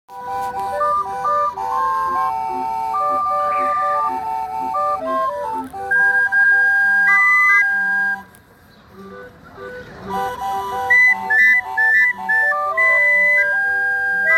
plaza hidalgo organillero, mexico coyoacan

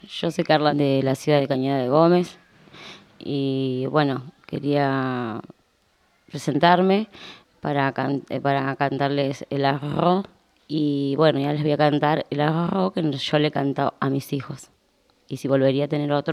canciones de cuna

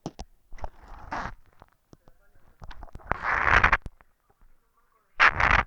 toma mate; Oído Absoluto

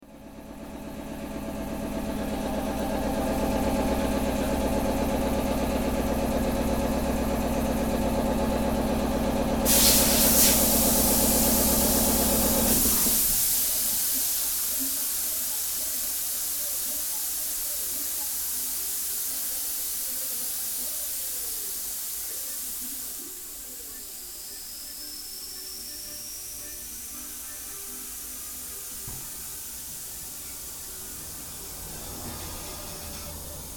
esquina gomeria; corrientes; don roldan

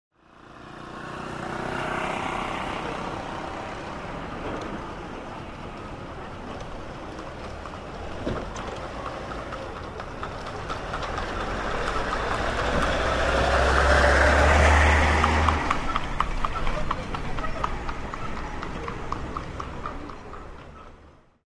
calles; juan m gutierrez y corrientes

